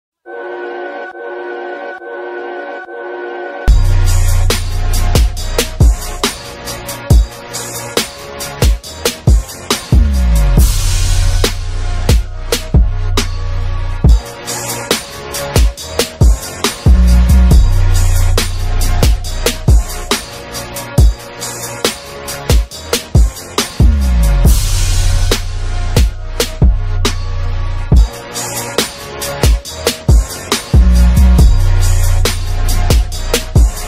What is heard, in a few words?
Instrumental